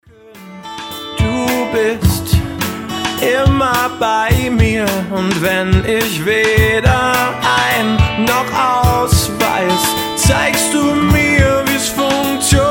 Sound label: Song, DE